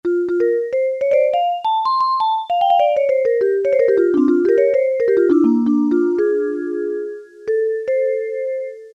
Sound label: VIBRAPHONE, Casio Rapman; Tones